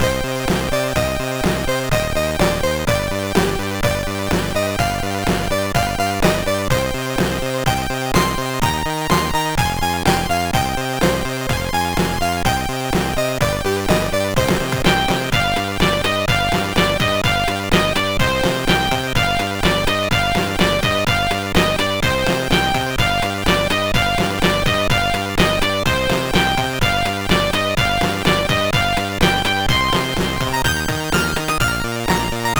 Russian digimix; CSG8580R5

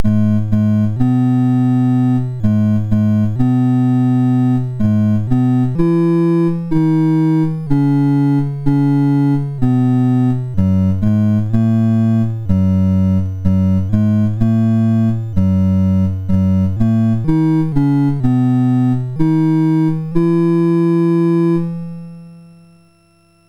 sid MOS6581R4; Bear George

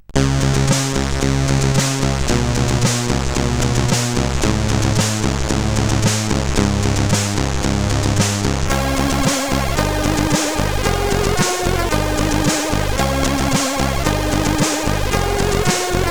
March, CSG8580R5

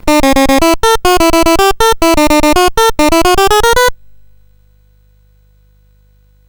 sid CSG8580R5; Munch Mania

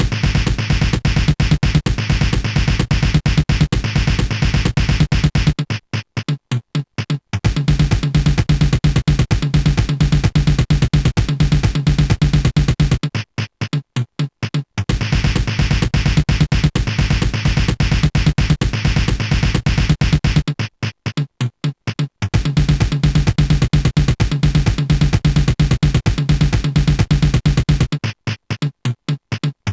rain chant, mix; Deluxe Music Construction Set

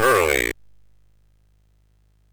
sid MOS6581R2; Cave of the Word Wizard Set 3

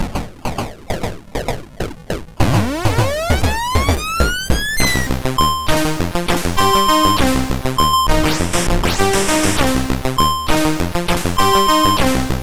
Synth, MOS6581R3

